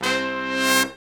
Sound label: BRS SHRT, R&R Horns, Sect; Roland L-CDX-03